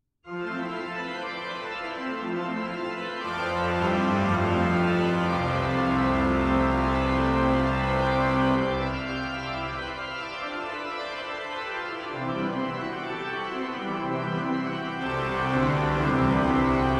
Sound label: Orgelsymfoni